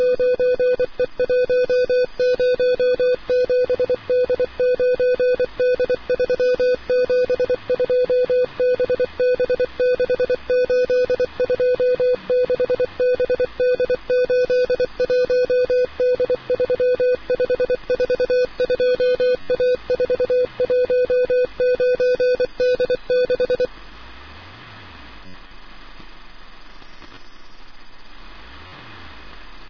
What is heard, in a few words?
ambient; Portal 2; Source Engine; video games